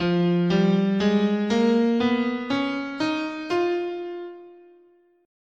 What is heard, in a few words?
F Locrian sharp 2, scale